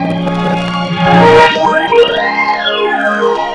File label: Synth Melody; Eclectic Electronica